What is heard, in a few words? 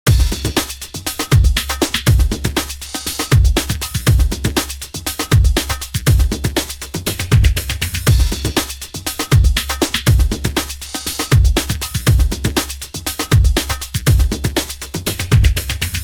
drumloop npfilter